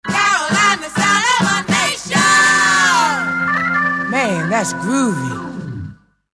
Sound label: Radio Caroline Jingle Man That's Groovy